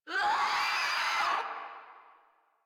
Infested Crawler Attack Short; Warframe

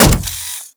Riot Moa Step Default, Footsteps; Warframe

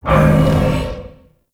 Survival Spirit Impact; Warframe; Zariman

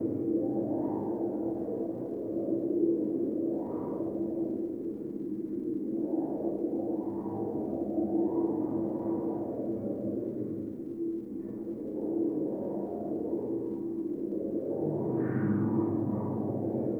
Under City Alley Bed Medium; Ambience; Dungeons; Beds

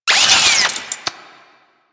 Necramech Repair Drone Idle Long; Warframe

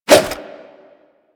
Nunchaku End Whoosh; Warframe; Tenno

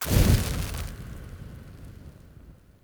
Soul Mode Hand Flame Loop Start; Powers; Fenn

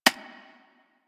Step; Warframe; Sentients; Brachiolyst

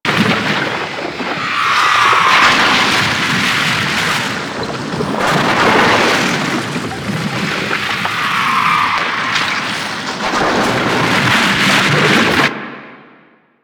Landscape Mega Heat Fissure Layer Loop; Ambience; Venus; Warframe; Venus Open World

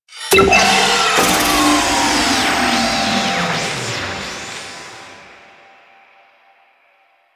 Territories Point Capture; Missions

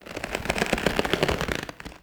Witch Station Material Texture; Ambience; Soul Plane

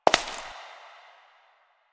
Step Rock; Warframe; Koumei

